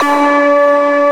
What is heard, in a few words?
STACK, FAIRLGHT; East West; Global Sounds